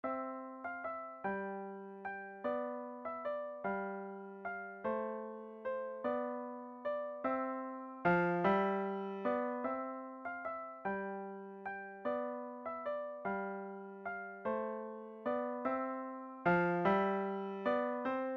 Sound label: Dotted Quarter Sonata, beginner piano music; Mozart